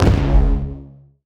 hit wave hard; CrossCode; battle

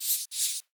icebug attack squeak; mine; battle

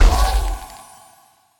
ball hit cold hard; CrossCode; battle

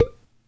pipe Hit; World Of Goo

